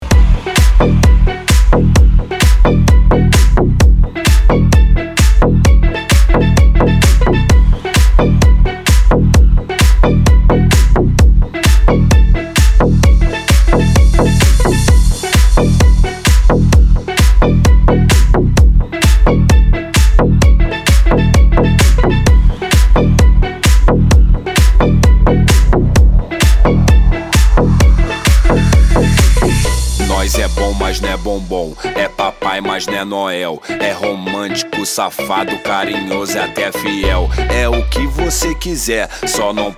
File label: retro